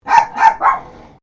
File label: Animal Dogs Chihuahuas Barking; Newcastle; Various domestic dogs; Dog Sound Effects; Dogs (Sound Effects:Animals); Foundation1